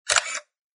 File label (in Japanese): カメラ・シャッター